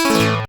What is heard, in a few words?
Braille Off; fenrir